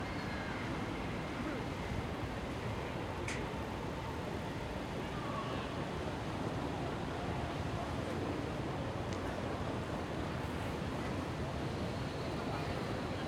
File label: Ambience Berlin City Alexander Platz; SoundBits; Collected Ambiences